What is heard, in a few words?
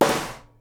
SNARE, Live Snares